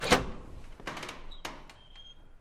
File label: door, metal